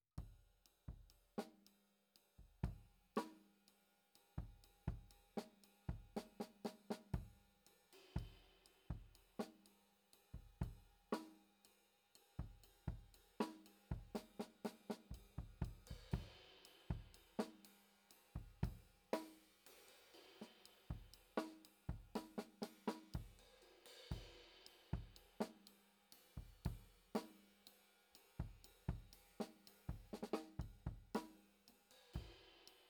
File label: KIT